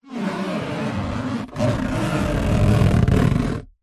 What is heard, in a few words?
plastic tub hand rub wipe squeak wet single; foley